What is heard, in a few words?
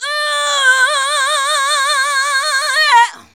VOX, Singin